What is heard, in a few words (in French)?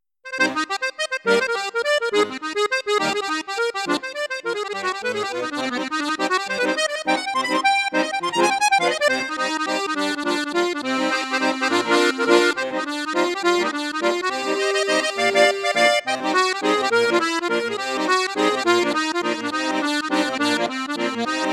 registre flute juste flute haute, accordéon; mengascini f5